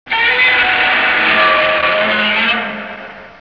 godzilla roar

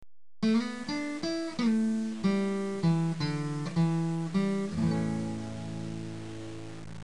riff

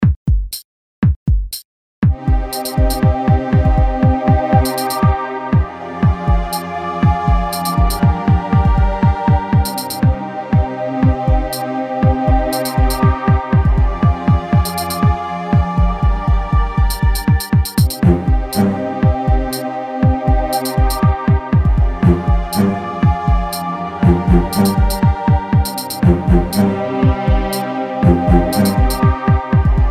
Symphonic Movement

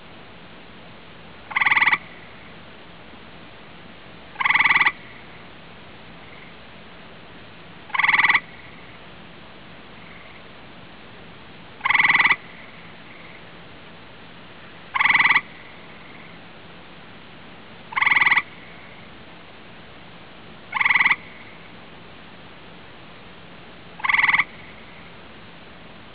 gray tree frog

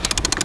Ratchet

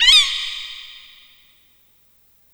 Kweh Sound

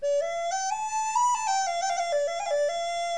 flautam, mirliton

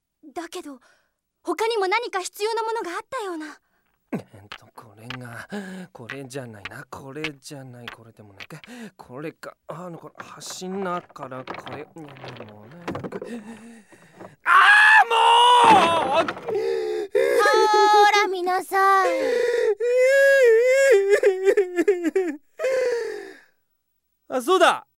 muttering crying weird

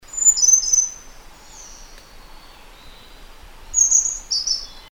Snowy browed Flycatcher, birdcall

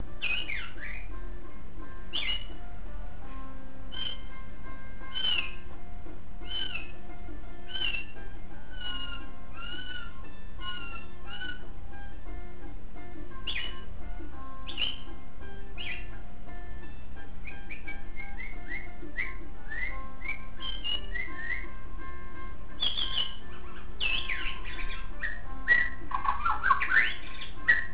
loud territorial singing

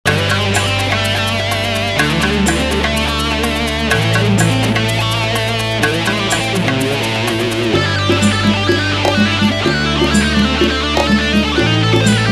shout

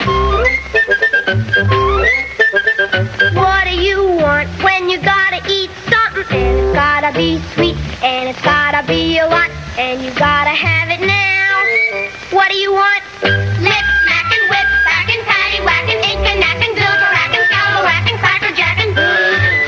Cracker Jacks

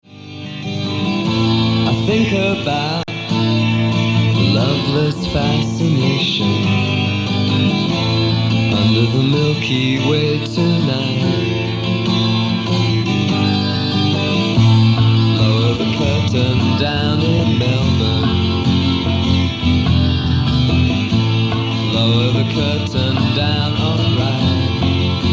acoustic sermon

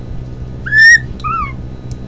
wolf

